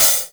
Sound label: OHH; akai; mpc1000 factory preset samples